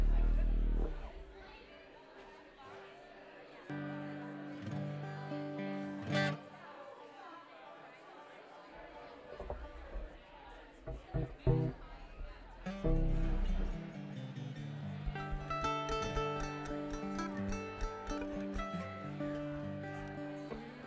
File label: Crowd Banter